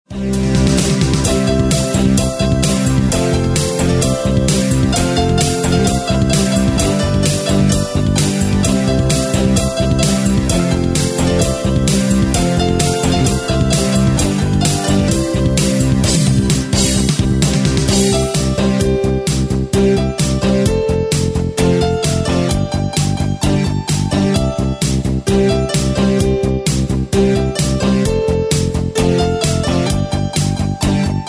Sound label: jingle bells